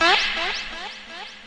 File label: eko stab, Electronic Hits